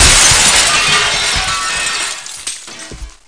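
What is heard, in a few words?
large, impact explosion; SINDEMO; glass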